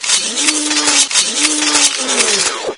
melee attack no vo hand; doom3; sabaoth

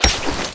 armor break; snds jack gmod

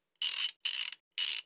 tune

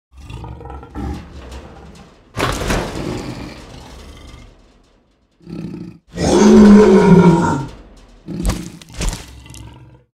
deathclaw cage exit; npc

